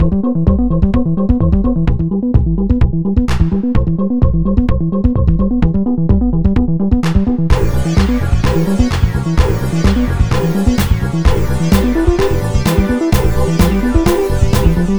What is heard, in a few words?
EDM 8 Bar Song; Reason